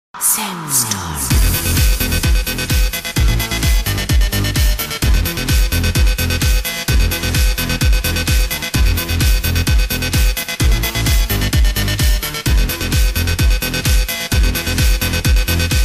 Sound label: bass